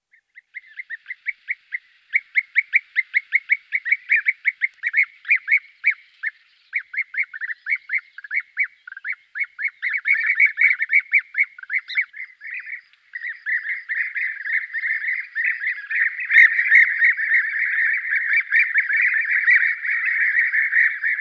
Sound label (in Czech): Vlha pestra Merops apiaster, nasiptaci